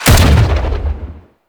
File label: Sentient Rifle Shot; Warframe; New War